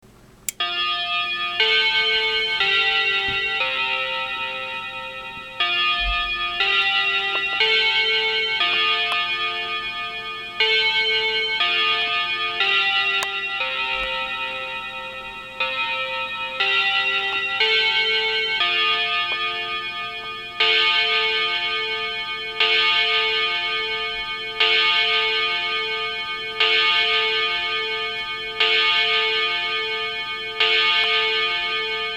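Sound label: Westminster Chime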